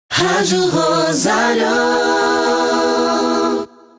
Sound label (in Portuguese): ACAPELLA, vinhetas; shopping; ROSARIO